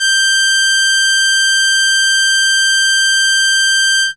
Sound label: Violin G6, ARP Solina, Unmodulated; musicradar-synth-samples